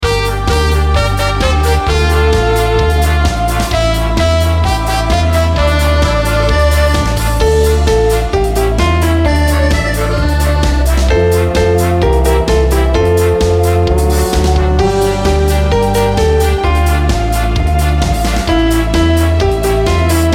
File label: karaoke